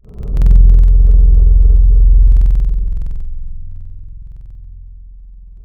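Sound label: Vocal Flute Vox, wavetable; Synthetic's Serum Collection; Echo Sound Works Core Tables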